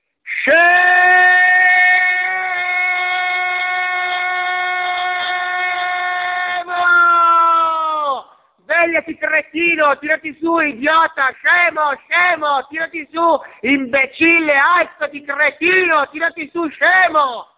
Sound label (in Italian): sveglia; scemo